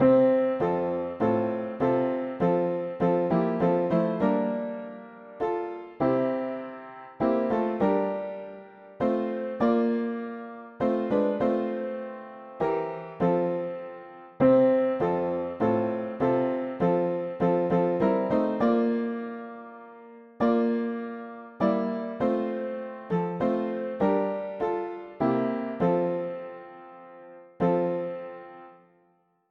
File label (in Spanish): mono piano; hymns; Himnario pequeno